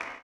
CLAP, blues & soul; ILIO; Vocal Planet

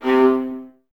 String Ensembles, Violas, VLS Detache

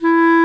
Clarinet & Bass Clarinet; OMI - Universe of Sounds; EII Factory Library

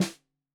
Snare 65 Reg Stereo, Deeply Sampled Drums

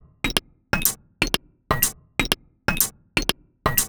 Top 123bpm, House Drumloops; Zero-G House Fabrik Drumloops

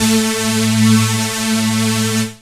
saw lead synth

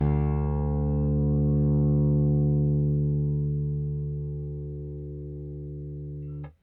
RickThumb-B, RickenbackerBass; Airwindows

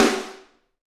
SD Real, Roland; Sound & Vision - Gigapack I

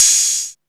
808 OPEN, hihat

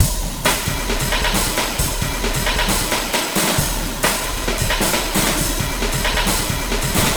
Groovy Drumz 134

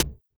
Hi Hat, drums; Sonic Foundry (Sony Creative Software); Drums & Drones; Construction Kits; Stream; Steam Kit

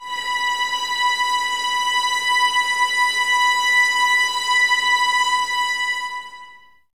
Violins Sul Ponte, String Ensembles; Miroslav Vitous; 11 Violins

